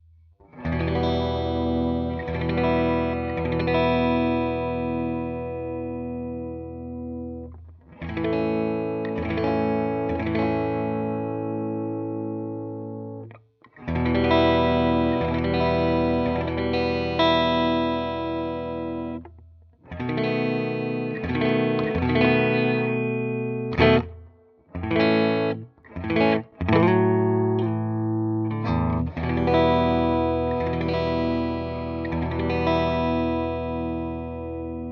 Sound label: neck clean